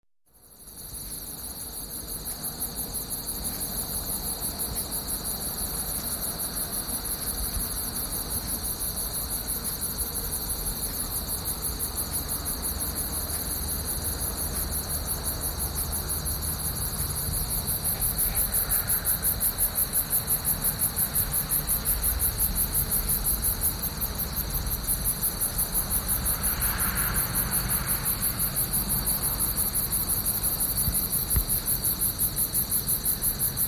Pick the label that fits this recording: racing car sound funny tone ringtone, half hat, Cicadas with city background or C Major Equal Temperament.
Cicadas with city background